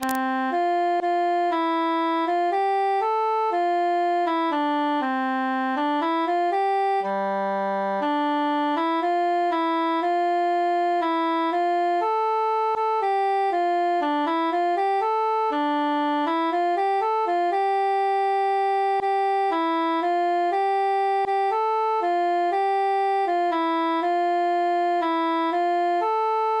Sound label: quintus solo; silver swan